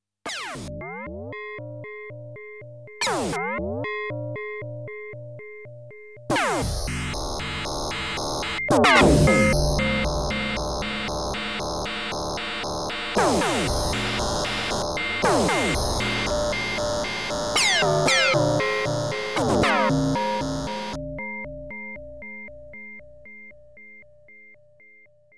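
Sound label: electronic sounds laser explosions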